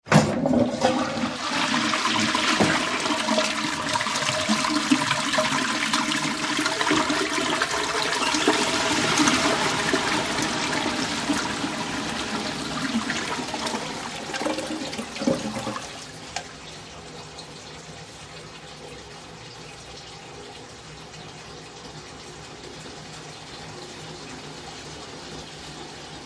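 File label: High Tank Toilet Flush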